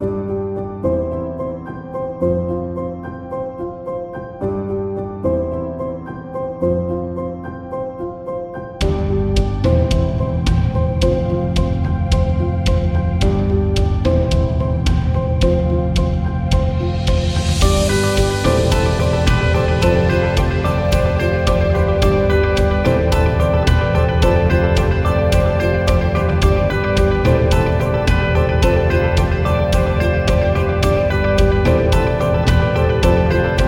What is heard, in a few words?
Rock Cinematic